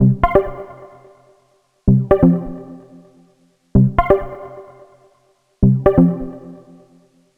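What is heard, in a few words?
synth, pluck, 128; skudger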